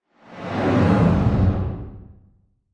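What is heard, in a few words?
Brake Electric Drill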